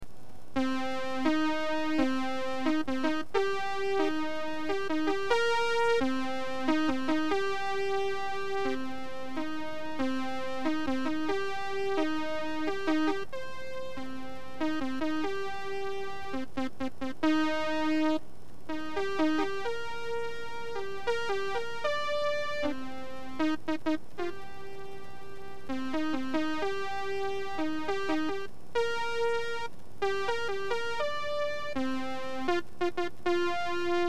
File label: Techno